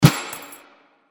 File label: stop shooting, helicopter vehicle